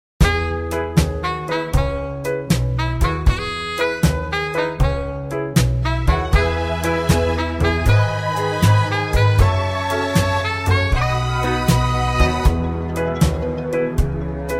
(E) No Backing Vocals

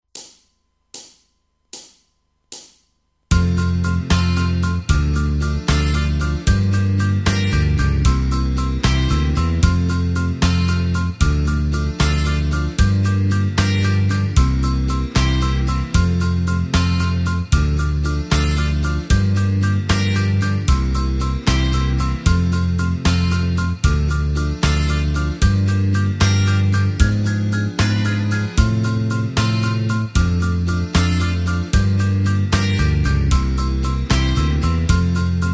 No Lead Guitar; F To Gb

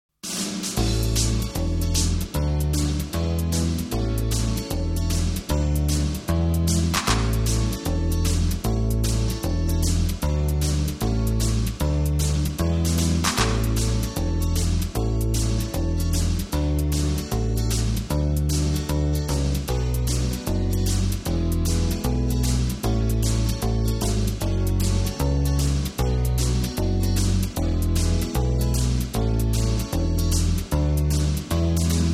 A No Lead Guitar, backing tracks

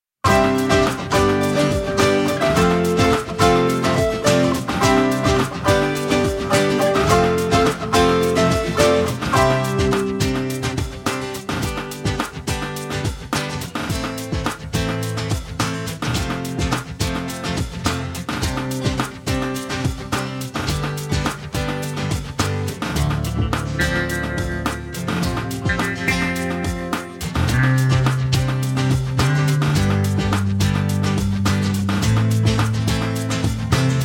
C No Backing Vocals, backing tracks

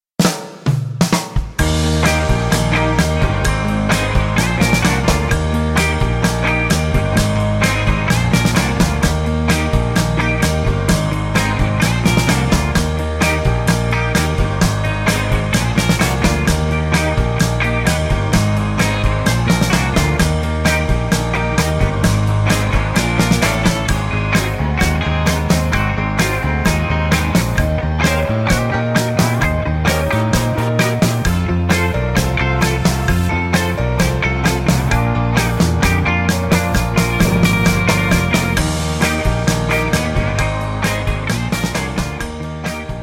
Eb No Backing Vocals, backing tracks

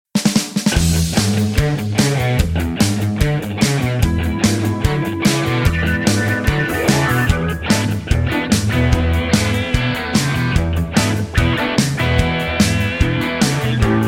E No Lead Solo No Backing Vocals, backing tracks

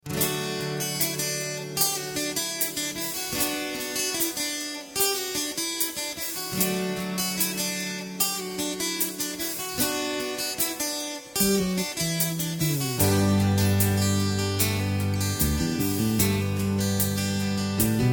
D No Backing Vocals, backing tracks

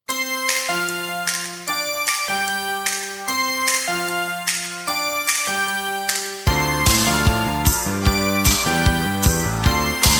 C No Backing Vocals, backing tracks

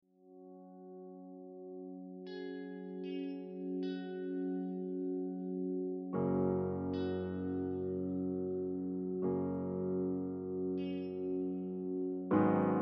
Gb No Backing Vocals, backing tracks

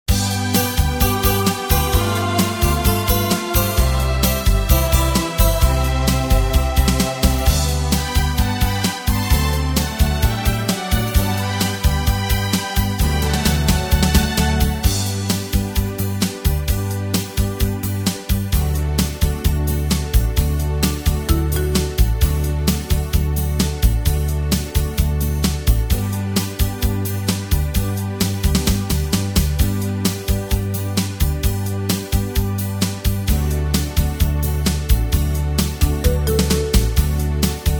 G, backing tracks

